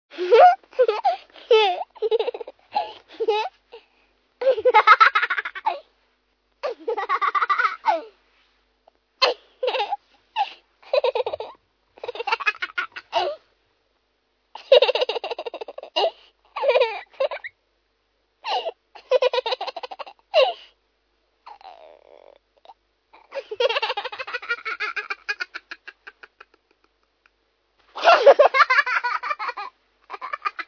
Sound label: giggle